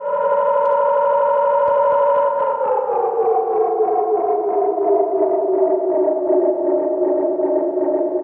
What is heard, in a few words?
Tape Delay Feedback; radiosonics